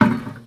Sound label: bucket sound